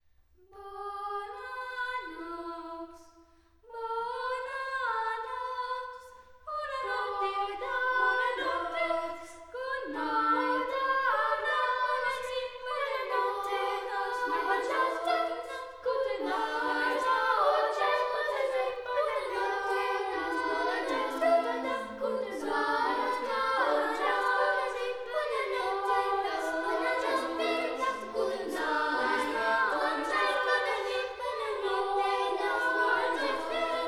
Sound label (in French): Choeur Canon; MOZART; Bona Nox